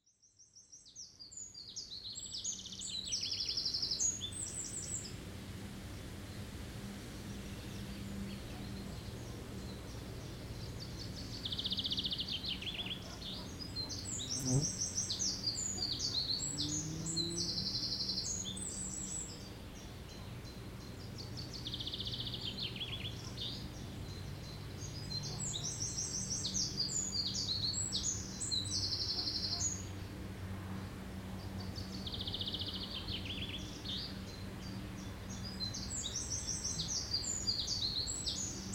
outside sounds - loud rooster; moms place; fieldrecs

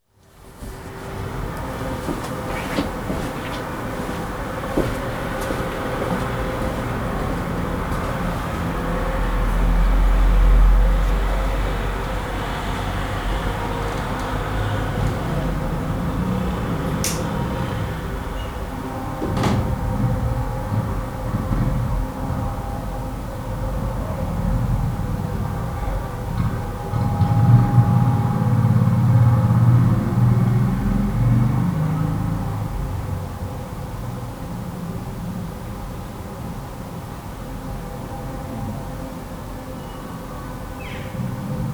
home distant horns sirens cough; fieldrecs

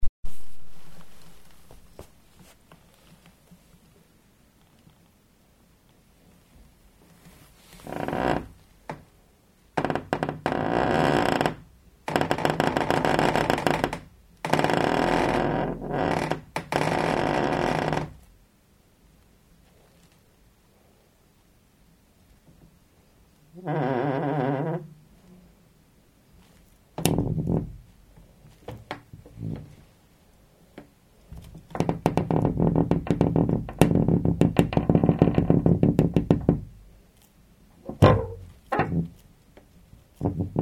squeaky door; fieldrecs

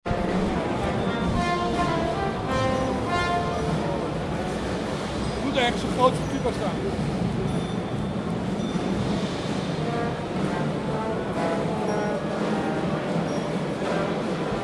messe - trumpets - drums; frankfurt; fieldrecs

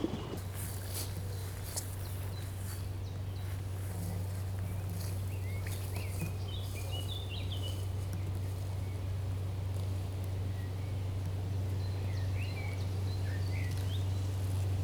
motor ship in canal; fieldrecs

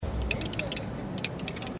clickies; messe frankfurt; fieldrecs

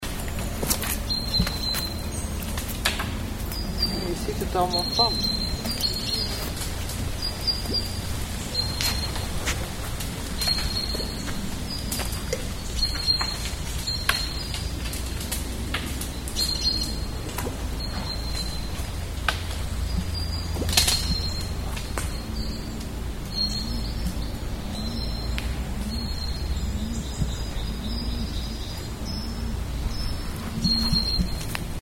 falling acorns; fieldrecs

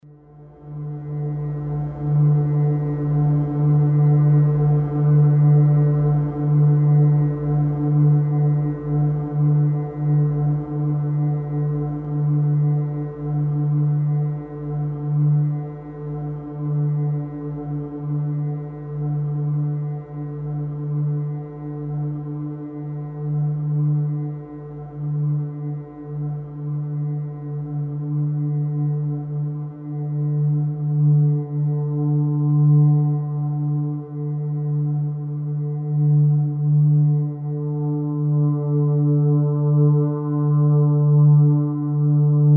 modulation pulse

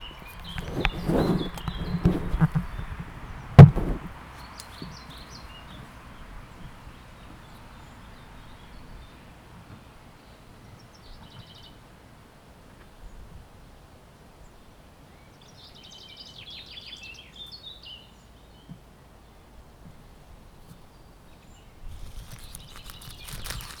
birds and cars; fieldrecs

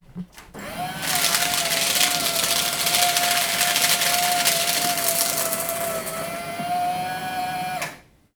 work shredder; fieldrecs

